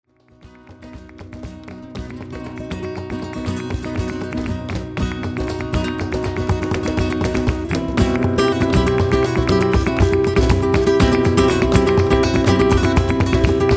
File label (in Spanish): noche flamenca